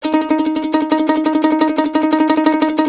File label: C E trill D#, Blues Guitar